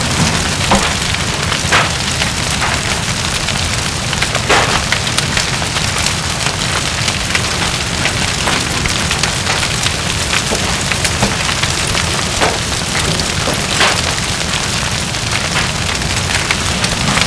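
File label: fire metal medium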